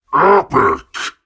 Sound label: Collect Epic Bear; mighty party 2017 gamerip pc ios android